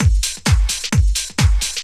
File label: drum 130 trance